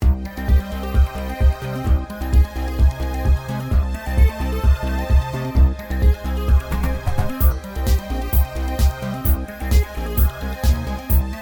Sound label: Jingle